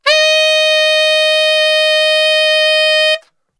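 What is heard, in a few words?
D# 3X SAX STR, saxophone; Best Service; Brass Super Section; SAX STRGHT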